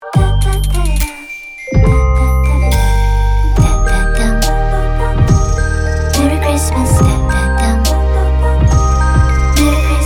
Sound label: PUB ENG chant; Pupa